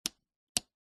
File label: household light switch click wall; zapsplat